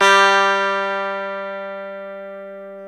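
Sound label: E 12 String guitar; Club-50 - Foundations Roland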